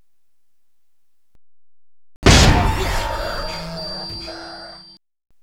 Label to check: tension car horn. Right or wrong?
Right